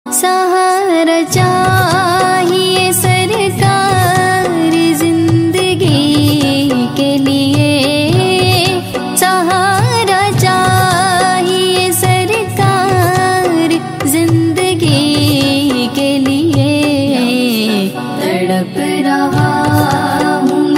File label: Ringtone Islamic